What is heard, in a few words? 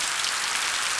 water pipe shoot